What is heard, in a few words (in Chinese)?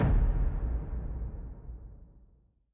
书店内 生死簿名字出现